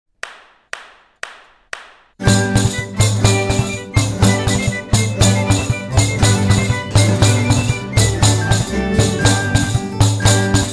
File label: orchestra